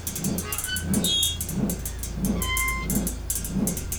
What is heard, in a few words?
wall fan, ambience